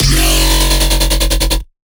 Bass Shot F# Plasma, Dubstep; Ghosthack; Advent Calendar 2018

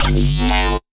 Titan Synth One Shot G, Dubstep; Synths; One Shots; Cymatics; Dubstep Starter Pack

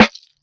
Snare D#; Drum One Shots; Snares; Cymatics; Eternity Sample Pack

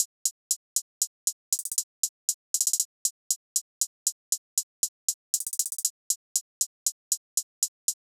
Drum Loop 118 BPM Hihat, Hip Hop; Drum Loops; Loop Stems; Cymatics; Nueva Drum Loop

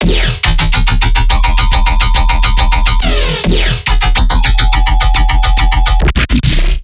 Riddim Bass Loop E 140; Trilobite; Ghosthack; Riddim Essentials Volume 2

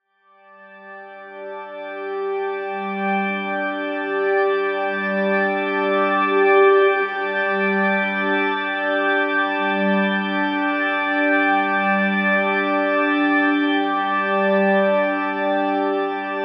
Pad G; One-Shots; Pads; Ghosthack; Color Bass Essentials

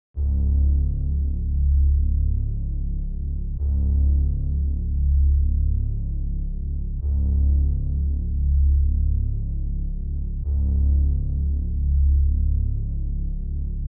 140 BPM C# Min Bass; Loop Stems; Cymatics; Too Tough; 2022 Melody Collection; Drill

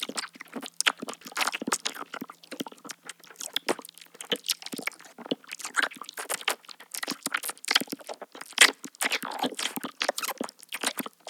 Foley - Gross Squelch; Loops; Ghosthack - Color Bass Essentials

